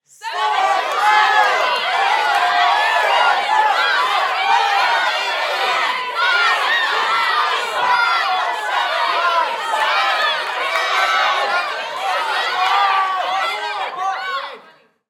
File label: Human Crowd Studio; Blastwave FX